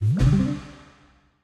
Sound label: quick Stop Bounce; double stacks